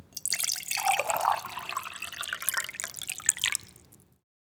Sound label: pouring water; soundscrate